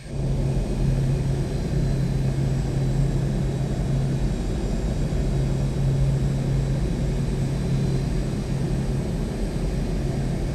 militia intro dropship; colony; Titanfall 2

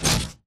bt footstep jog parts; Titanfall 2

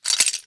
zipline detach; Titanfall 2

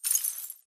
imp bullet lightballistic solidglass debris; Titanfall 2

